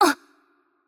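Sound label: Hurt Light, Japanese; bloom minigame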